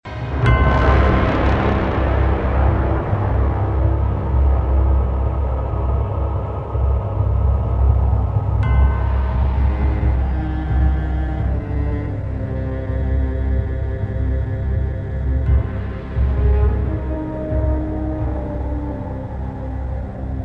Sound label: protoss defeat; blizzard; sc